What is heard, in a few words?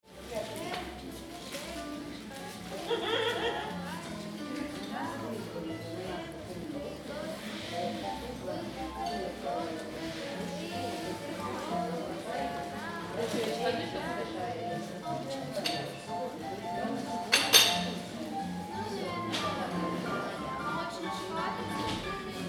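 hum of people in a Lviv coffee house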